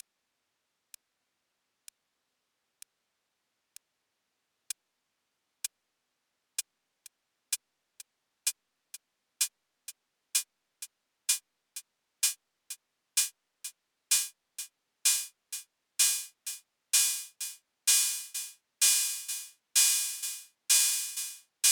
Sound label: hi-hat closed, Akai Rhythm Wolf